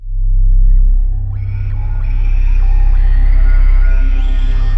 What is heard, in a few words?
WS NL C 1-L, Korg Wavestation; Back In Time Records; Korg Universe VOL-1